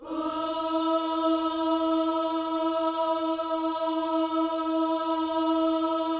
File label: OOS E, Vocal Gospel